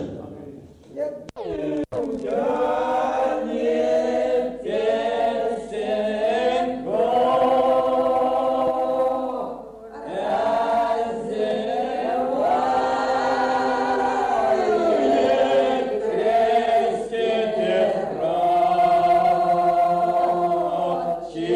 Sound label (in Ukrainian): фрагм рос пісня